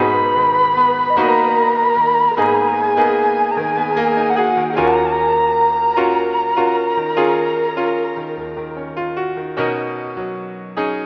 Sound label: piano and strings, Sad Loops